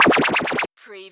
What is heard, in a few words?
CARTOON LASER